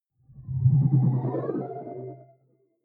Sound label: sci-fi display transmission damped